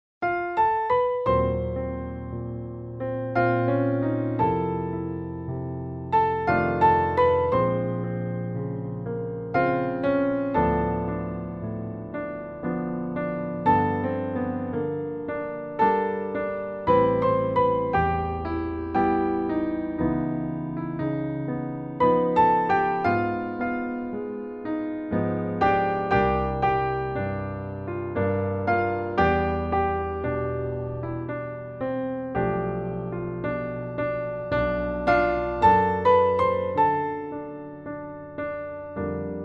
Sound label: blues, piano duet; recital pieces